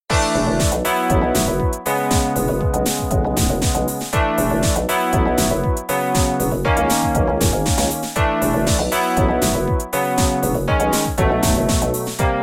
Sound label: Completion; Kyuukyuu Kyuumei Caduceus2